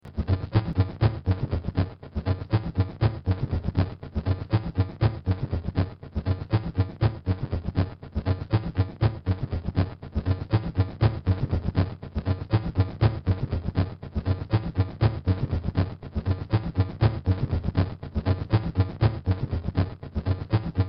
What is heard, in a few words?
music; DelphiArcadeGames; RocketShipDeltaStarter